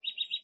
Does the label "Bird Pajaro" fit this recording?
Yes